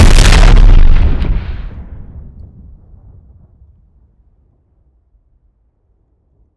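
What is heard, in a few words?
frag explosion; gmod; viper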